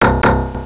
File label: dang dang